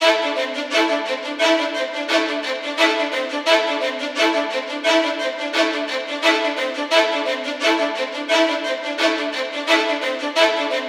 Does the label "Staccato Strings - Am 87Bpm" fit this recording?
Yes